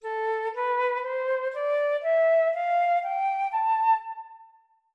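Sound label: natural minor A4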